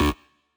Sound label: bass